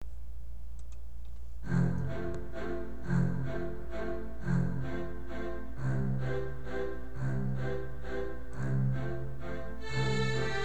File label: La Donna e Mobile accomp; Grade 10 Class Exam Solos; Xtras Classical Favorites